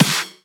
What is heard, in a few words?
Snares; Vengeance Samples